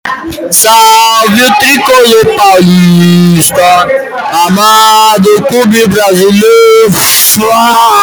cantando hino

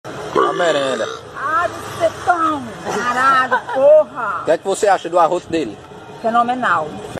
arroto

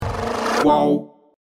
vinheta; uol

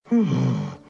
exhalando

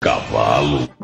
cavaalo

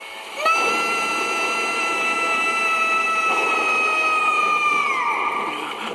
grito do esquilo; alvin

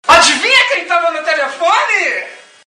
telefone; adivinha quem ligou

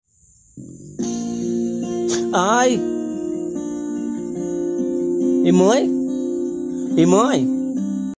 senhora rindo

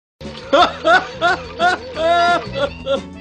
vinheta

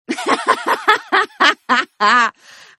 risada debochada; zoeira

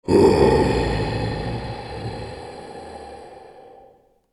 grito; giganton

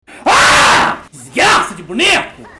gritando aaaa

